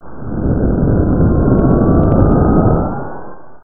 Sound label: jet; OctaMED SoundStudio V1; jazzies samples